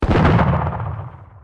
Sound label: second flame